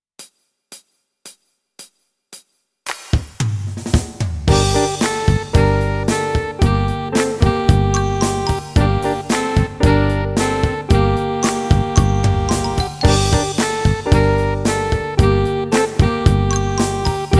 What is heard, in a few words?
F Sharp Jam Blues Eb, Eb Backing